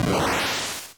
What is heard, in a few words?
bad explosion; SpaceScooter